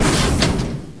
player pickup Strongbox

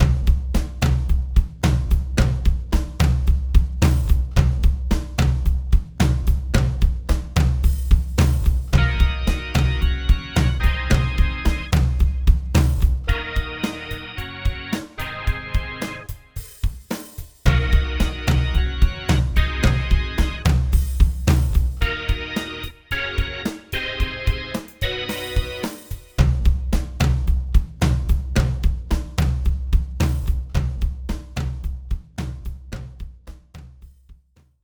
Bowser Bridge Drums Fast; Newer Super Mario Bros Wii

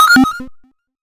1up; Graze Counter

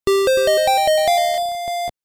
Flute; Shadowgate Classic; GBC

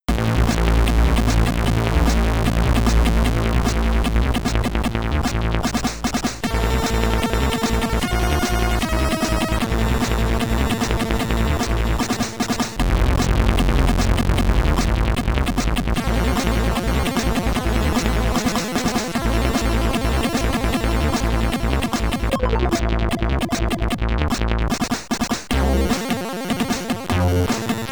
BGM (SID Stereo), commodore 64; Alien 3

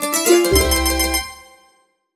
Jingle Salon Finish Table Short; clubhouse games 51 worldwide classics 2020 switch gamerip

